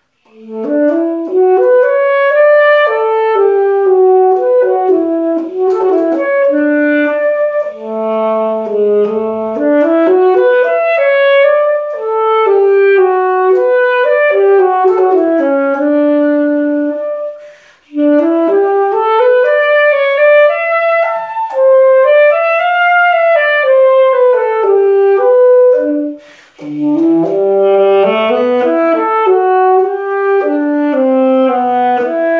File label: Sax